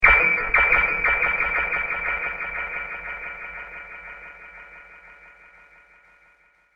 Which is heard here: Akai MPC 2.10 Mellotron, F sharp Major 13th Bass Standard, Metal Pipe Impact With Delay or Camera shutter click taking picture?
Metal Pipe Impact With Delay